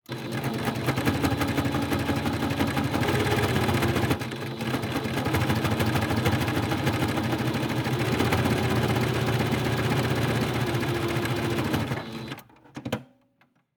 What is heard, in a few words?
long variable speed run audible relay clicks, Electric sewing machines; Omar Alvarado